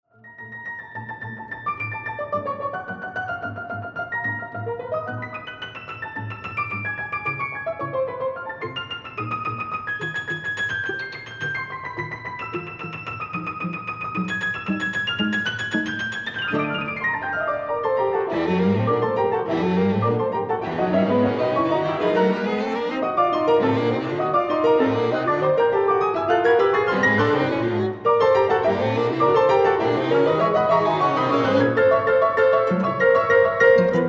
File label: Quintet, Presto